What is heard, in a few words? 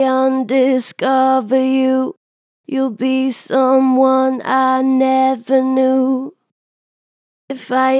Strong Vibrato, Natural Vibrato Strong, Vocals; Undiscover you; Harmor Vocals